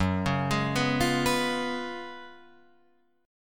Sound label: F sharp Major 7th Suspended 2nd Suspended 4th, Guitar, Open F; F#M7sus2sus4 arpeggiated